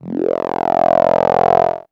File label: Strange Sound; Sonic Foundry (Sony Creative Software) - Stylus Pressure Urban Grooves on Digital Wax; Chase Scene